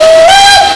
Fluit kort radio

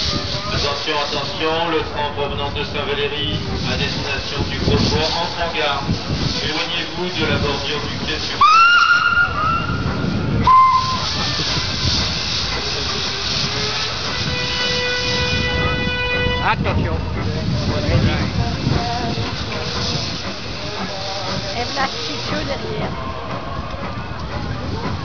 station aankomst

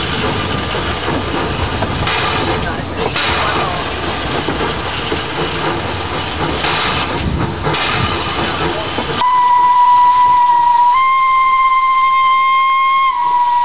fluit stoom; Aisne

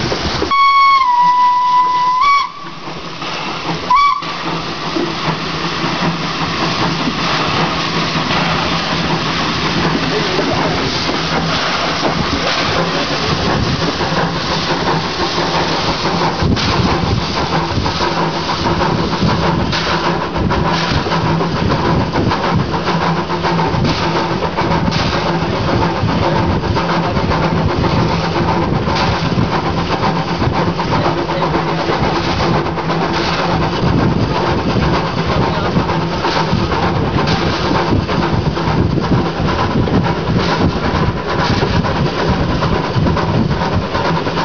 fluit versnellen; Aisne

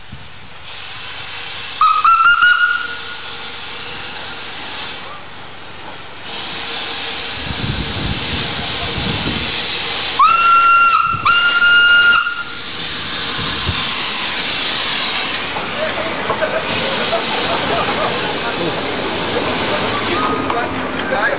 140C fluit stoom

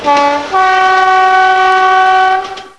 Billard

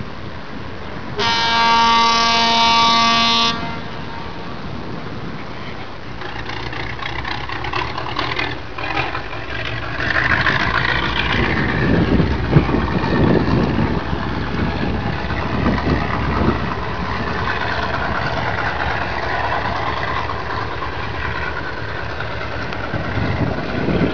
diesel hoorn vertrek

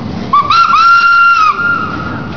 fluit; 140C

